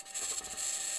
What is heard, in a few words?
mic, mars2020 edlcam